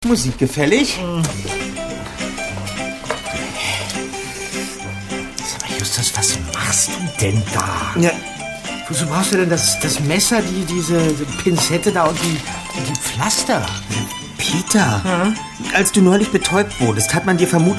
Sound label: tavern